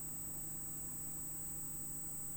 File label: Hydrophone